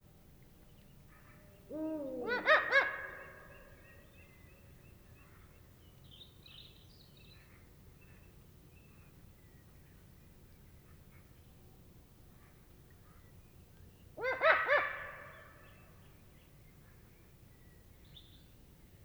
Eurasian Eagle Owl Devils Cackles Of Female Hooting Of Male